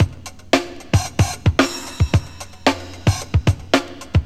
FUNKY 95, loop; 0- 99 BPM

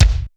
BD, bass drum

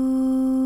Ooh C2; Harmor; Generators

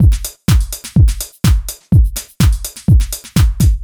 DEEPHOUSE BEATS 125

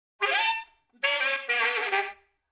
OLDSCHOOL BRASS 96

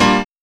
HIT

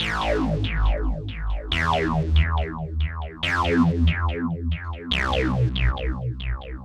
synth tekno 140 A; Techno2; Keys

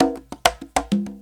CONGA 100, PERCUS LOOPS

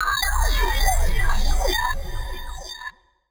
TECHNO EXTRA 140

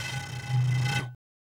bottle, ethno, 125

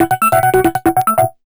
TECHNO SYNTH 140; Keys

